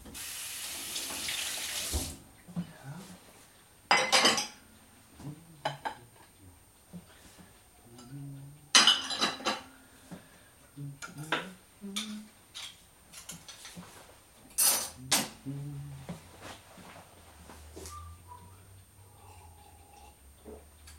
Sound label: kitchen